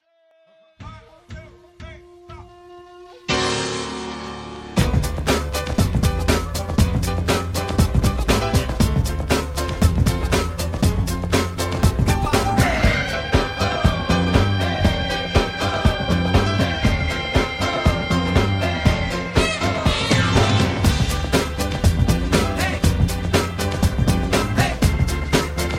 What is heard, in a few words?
Hip Hop